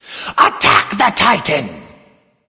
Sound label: Laughing Skull Attack; Bakasura